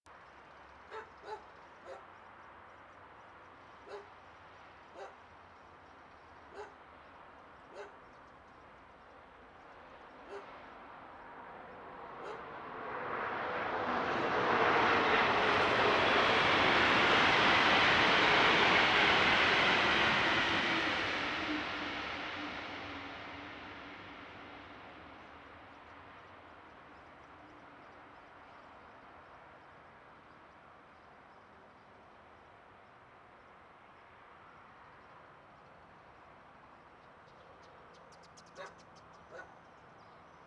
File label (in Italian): Treno mono; Eigenmike; A-weighted; Nuova Strada